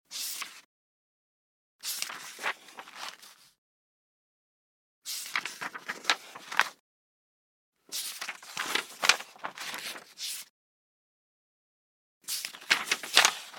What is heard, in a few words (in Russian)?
perelistyvajut stranicy v knige